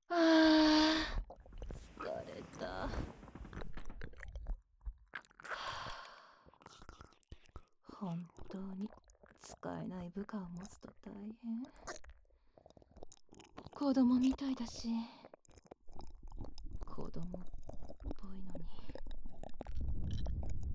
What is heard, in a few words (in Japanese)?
耳舐め オナニー ベロチュー フェラ 騎乗位 音声; 相部屋になったクールな女上司のオナニーを目撃し、そのあとベロチュー、フェラ、騎乗位でイかされる音声